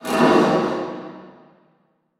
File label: Pot Remove